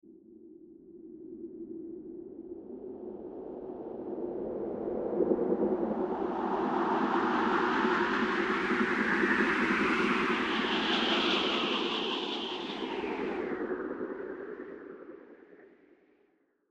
Sci Fi Wind Swells Pulse Synthesized, Meteor Twinkle Fly By Swell Synth; Blastwave